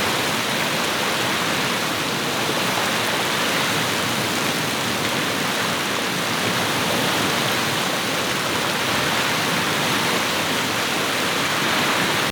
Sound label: Waterfall Medium Loop